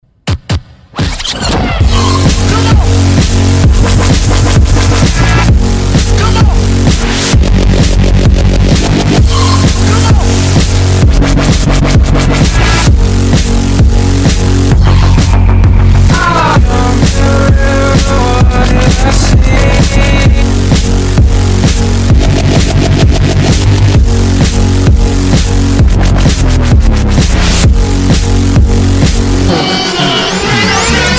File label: Hard Electro